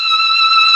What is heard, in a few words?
MELLOTRON; East West - Global Sounds